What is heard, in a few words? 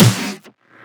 snare oneshot; GhostHack